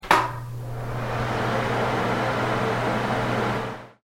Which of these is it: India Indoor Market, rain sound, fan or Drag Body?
fan